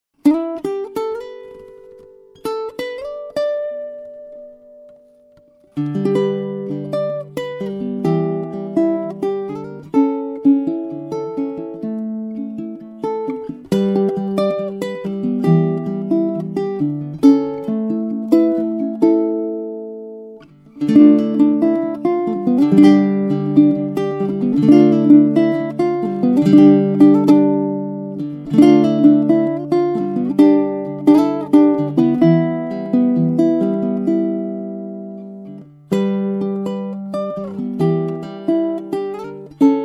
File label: uke